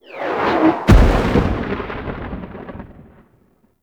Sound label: Hazard End; Volt Prime Boss; Warframes